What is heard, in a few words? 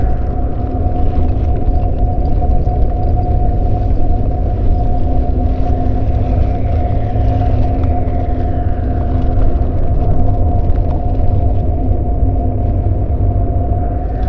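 lithoid ambient battleship hum; Stellaris; lithoids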